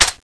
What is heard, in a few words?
sg552 clip out, weapons; buff sg552ex